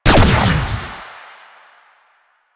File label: Fire Shot, Weapons; Duviri; Incarnon Adapters; Incarnon Cestra